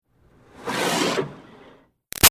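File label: Water Spray Interior Car Grill Med; Car Wash; BigRoomSound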